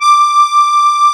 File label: HOHNER, akkordeon